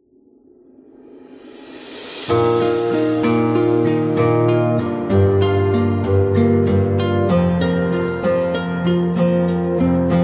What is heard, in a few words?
Guitar